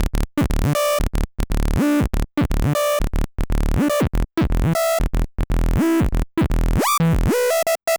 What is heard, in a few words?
Bass